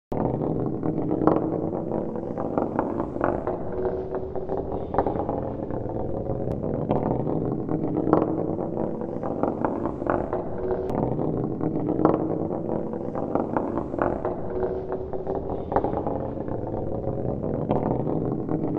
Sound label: Ball rolling sound; ball rolling sound effect